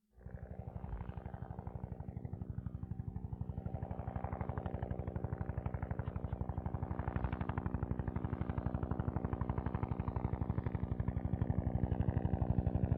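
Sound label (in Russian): вертолет пролетает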